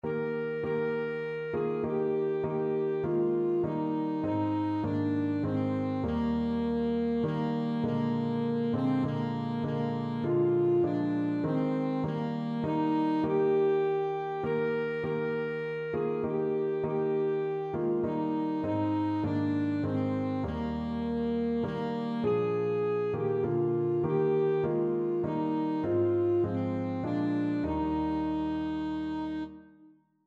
ASAX, saxophone

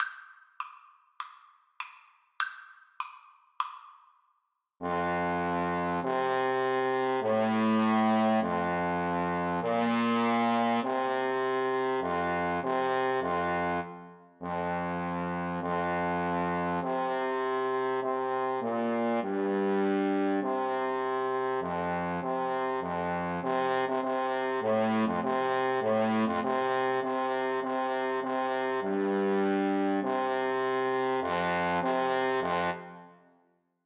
Trombone Duet

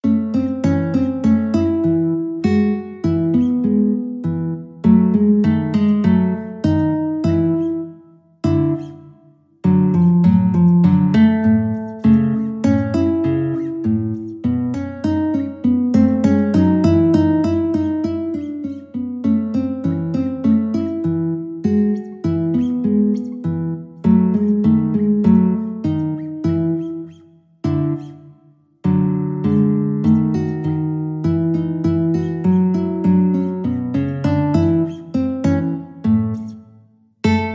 guitar duet

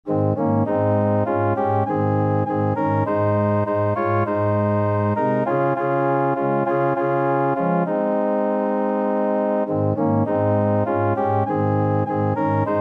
organ

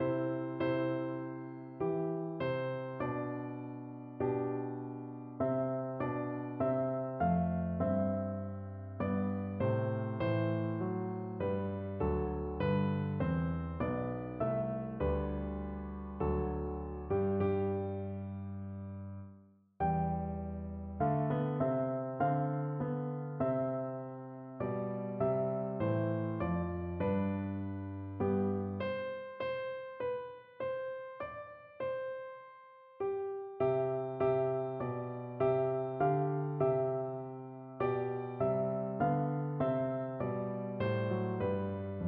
o come piano duet

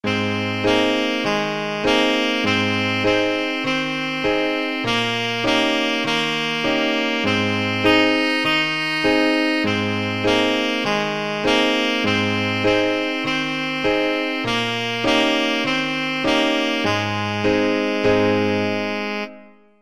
saxophone

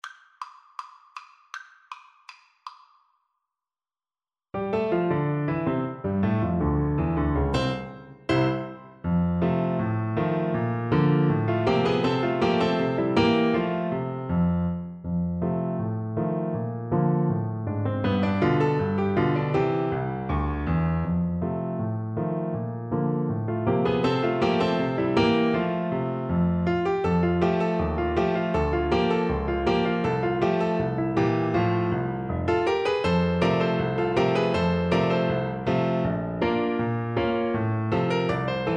the entertainer, oboe

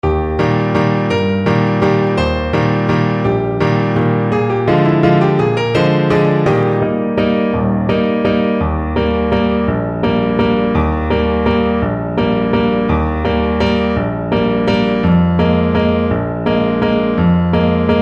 Clarinet

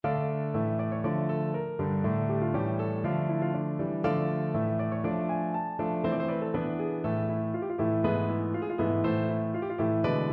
scarlatti gavotta piano

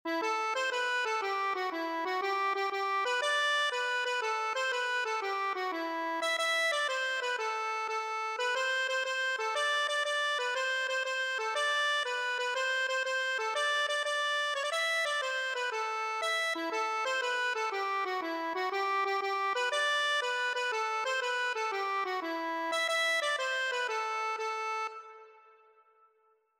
freedom erin, accordion

